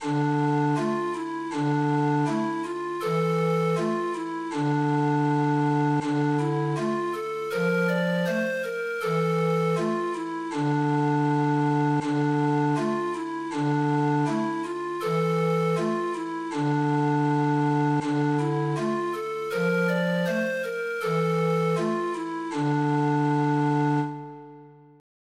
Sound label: ravens